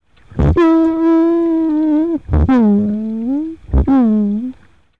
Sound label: grey seal sounds